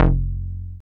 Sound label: BAS, Eurotech; Sounds Good